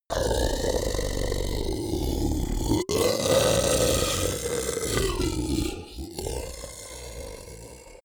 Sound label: beast grunt warning angry; kreaturen; comedy-cartoon; soundpool